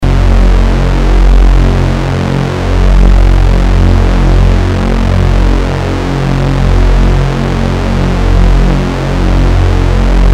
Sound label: saw saw